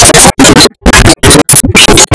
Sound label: bell